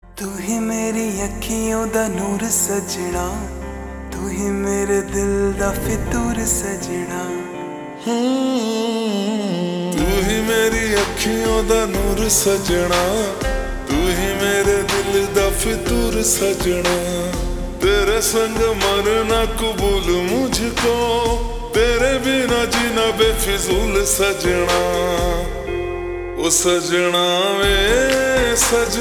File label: Slow Reverb Version Ringtone